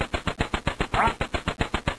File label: duck flap